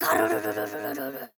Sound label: girl garururu